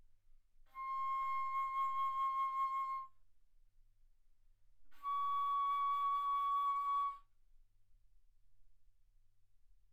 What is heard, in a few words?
Bass Flute ff C6 Db6